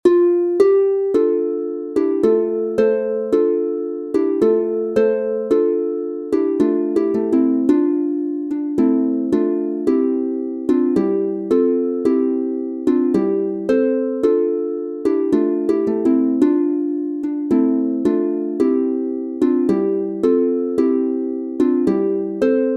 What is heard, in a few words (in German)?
Advents und Weihnachtslieder international, Harfe; Adoracion al nino Jesus Alle Sterne; F Dur 3 st